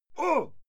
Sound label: male fight yell short